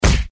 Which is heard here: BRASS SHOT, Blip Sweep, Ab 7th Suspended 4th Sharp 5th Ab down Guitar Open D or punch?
punch